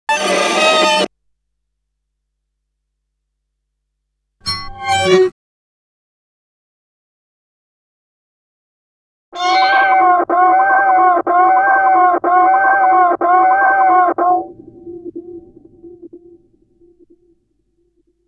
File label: Cello Transformation; Bizarre Planet Sampling CD